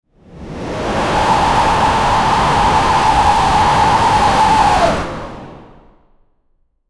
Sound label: create fast whoosh sound